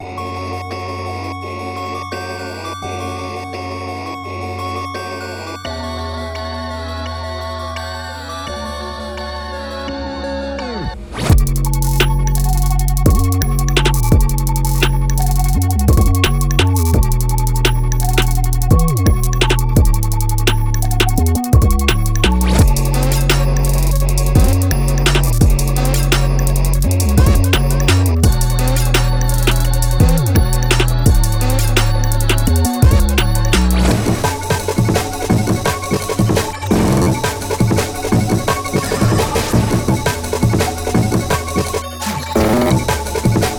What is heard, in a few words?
Remix, Instrumental; Friday Night Funkin ost vol. 3 2024